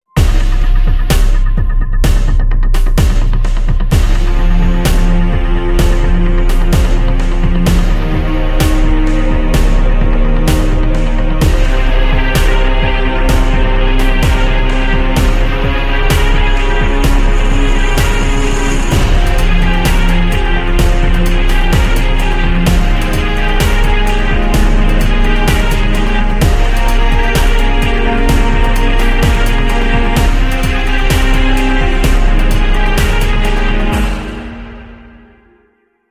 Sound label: Violin